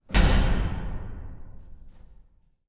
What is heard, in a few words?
impact metal large sheet thud